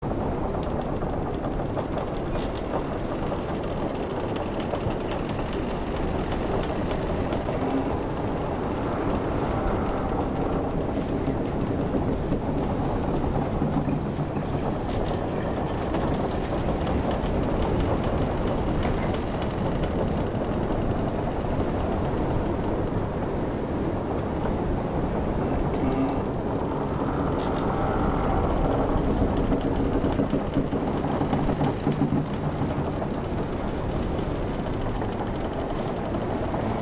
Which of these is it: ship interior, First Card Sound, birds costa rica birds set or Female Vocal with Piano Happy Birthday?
ship interior